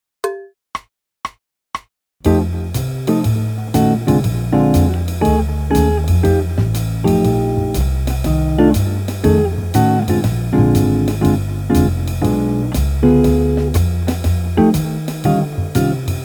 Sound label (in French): blues jazz en F